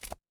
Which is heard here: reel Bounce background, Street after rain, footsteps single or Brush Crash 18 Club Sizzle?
footsteps single